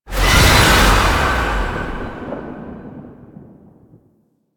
Impiety Impact; Warframe; Warframes; Dagath

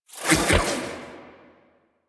Archon Whip Spin Whoosh; Enemies; Weapons; Warframe; The New War; Nira

